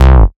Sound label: Bass C, Offbeat Bass